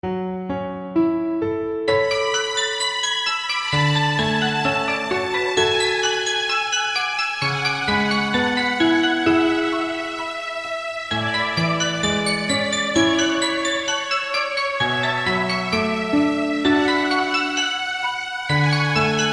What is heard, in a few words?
Violin; Vengence